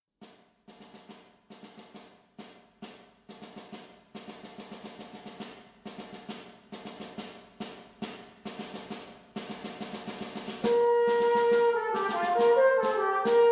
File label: Bolero de Ravel Solo Trombone